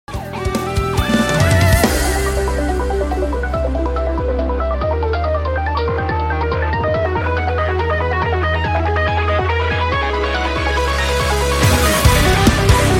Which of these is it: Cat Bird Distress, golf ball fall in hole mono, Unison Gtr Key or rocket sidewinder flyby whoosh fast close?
Unison Gtr Key